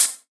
clicky open hi hat f sharp key, drum single hits one shots